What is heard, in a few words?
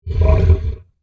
Monster Snarl, Horror, Monster, Snarl, Large, Creature